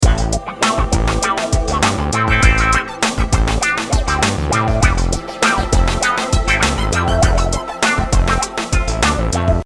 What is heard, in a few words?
guitar korg gadget